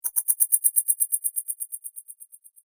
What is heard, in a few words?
Fantasy Spell Curse Of Sorrow; Magic Sound Effects